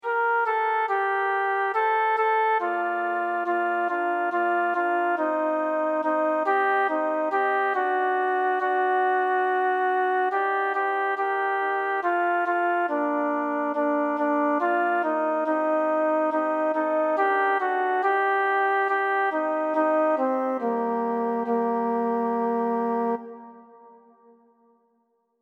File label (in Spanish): 1. VOZ; Ya los días son cortos